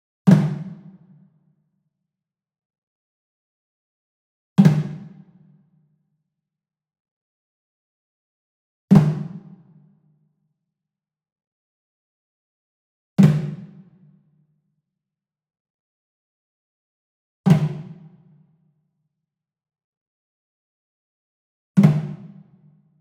WOOD PERCUSSION Wood Drum Mounted Flam Slap Medium; boom library; Cinematic Strikes Construction Kit